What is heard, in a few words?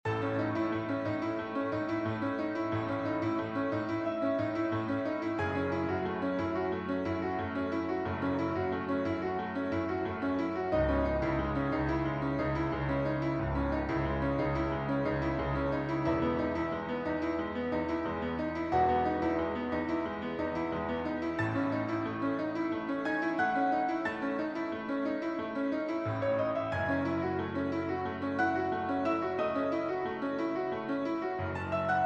instrumental fragment